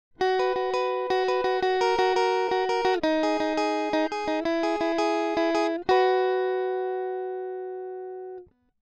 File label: guitar dry